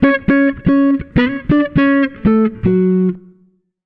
FK SOLO, Jazzistic; FNK

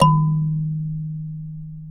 D#2 kalimba; WorldSounds

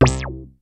HARD BASS; Kid Nepro; Elite

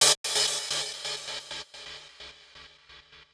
Gated Cymbal; musicradar

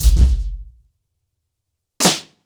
90BPM, acoustic drums; The Roots Drumloops

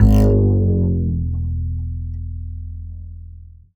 Upright Acoustic Bass; 1ST POSITION; Larry Seyer

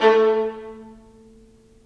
STR, Roland String Master Series, Vlns Marc

